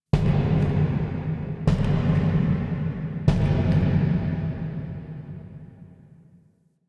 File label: Misc Knocks Large; Ambience